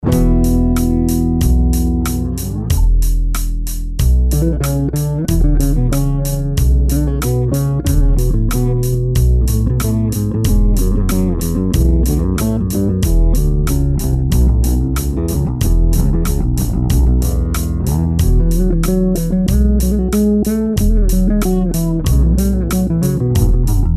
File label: 8 string active mode both pickups fingers, Bass; ShamrayBass